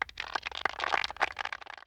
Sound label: applause; fishing